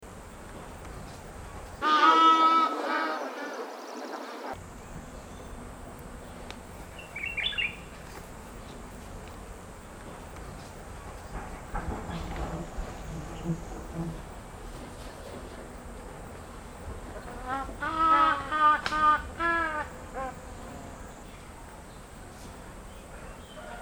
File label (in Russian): krasnonogiy ibis golos